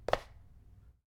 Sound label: Footstep; smite; god susano